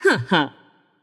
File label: nv heh heh; smite; voicepack; morgan le fay